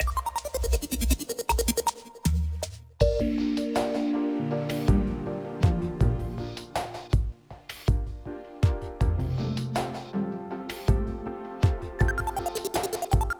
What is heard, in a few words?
Town Night